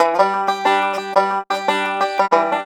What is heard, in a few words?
COUNTRY